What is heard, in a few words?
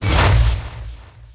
attack post; weapons; dota; elder titan